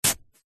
garden wooden sharp gardening tool push force into grass soil single; household